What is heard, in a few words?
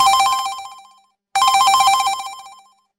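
incoming call old version, skype; vhodyaschiy zvonok staraya versiya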